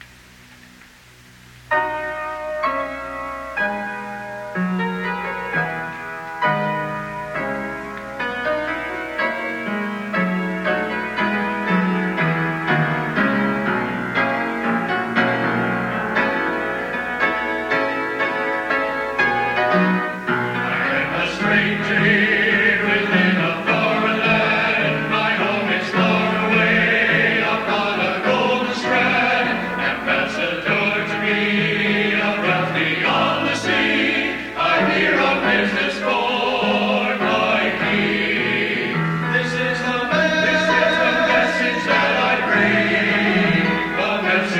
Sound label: Choir; The Kings Business